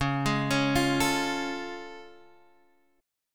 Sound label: A Augmented Major 7th Db